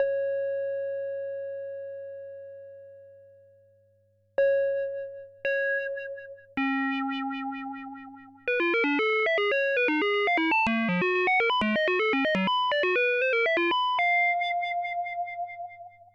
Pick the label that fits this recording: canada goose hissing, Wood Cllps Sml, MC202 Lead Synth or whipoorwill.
MC202 Lead Synth